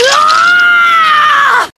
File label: Long Scream